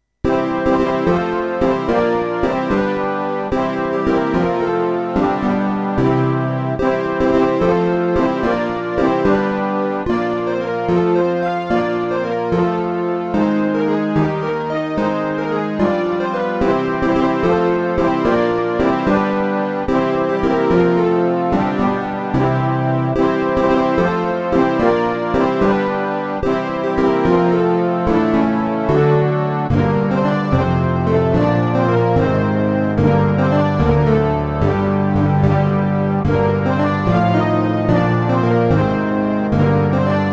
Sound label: Tarantella